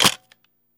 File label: 35mm Camera Clicks; shutters & movement